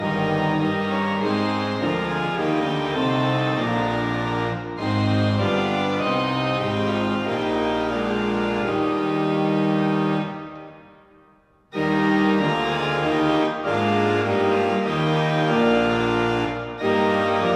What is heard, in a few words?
Hymn, Savannah; Love's redeeming Work is done; Hymnal 1982